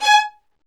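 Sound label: STR violins, Roland; L-CD702; Vlns Marc4-5; Vls Marc4 x8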